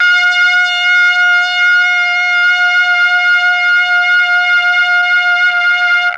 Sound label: MEL BRASS; ILIO; Propeller Island; Legendary M400